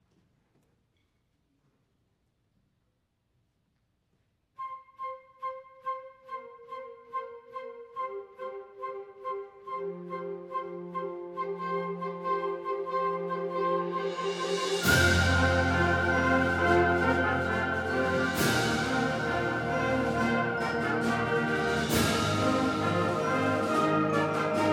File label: fanfare, wind band